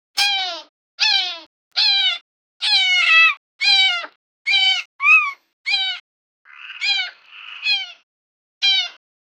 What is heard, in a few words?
baby parrot sound effect; avian